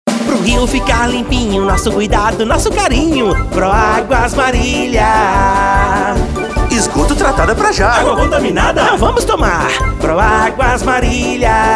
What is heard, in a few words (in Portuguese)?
Jingle; Pró-Águas